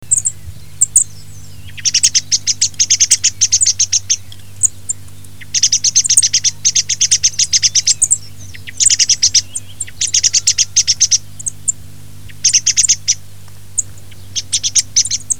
TORDO; CANTI SAG NATURE 2024

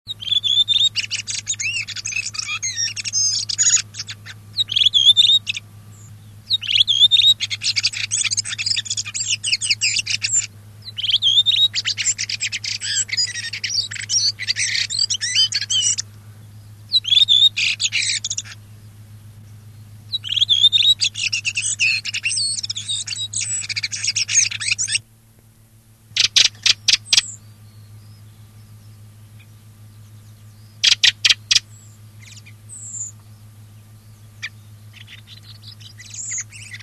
SASSELLO; CANTI SAG NATURE 2024

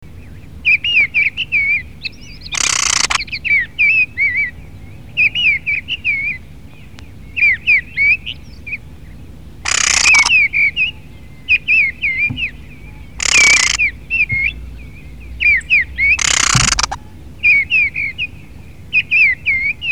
TORDELA; CANTI SAG NATURE 2024

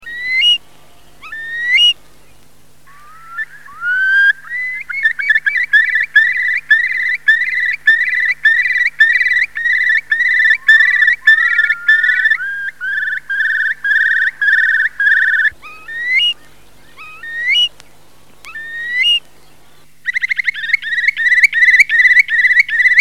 CHIURLO; CANTI SAG NATURE 2024

